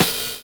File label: BUZZ FILL; Univers Sons - Kitch 70s